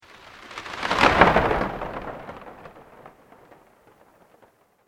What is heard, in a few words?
Sweep Organic Slow